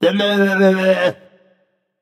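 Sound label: tongue, non-verbal; smite; voicepack; merlin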